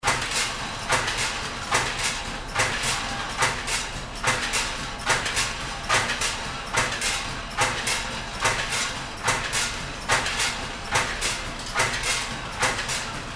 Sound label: packing machine